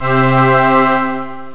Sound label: ahh chord